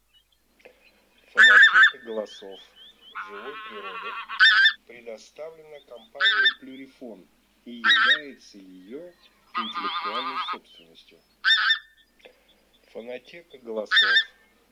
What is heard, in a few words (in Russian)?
Гуменник базар Oche Granaiolle; plurifon